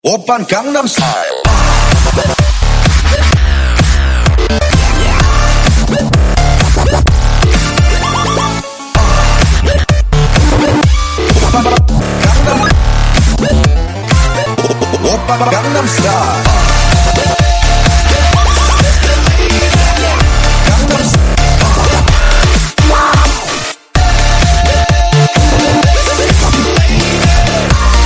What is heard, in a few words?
Gangstyle Dubstep